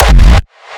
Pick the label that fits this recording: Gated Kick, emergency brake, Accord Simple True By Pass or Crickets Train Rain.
Gated Kick